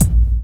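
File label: bass drum hip hop; DRMKIT; E-Lab; X Static Goldmine 2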